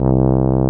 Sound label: Tuba, c2; BGM; Nintendo; July 2020 Gigaleak